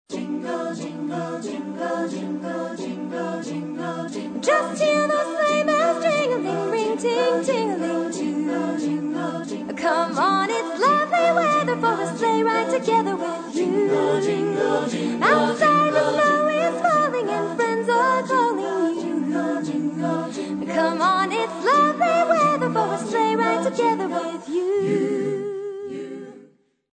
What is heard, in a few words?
carolers; speciality ensembles